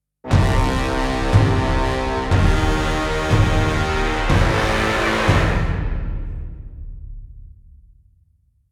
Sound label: Epic Orchestral Stinger